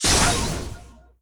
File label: Turn Fail Backwall; Disney Star Smash; Android, iOS, Mobile